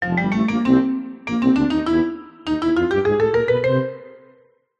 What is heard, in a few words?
cartoon sneaky walk music piano tuba marimba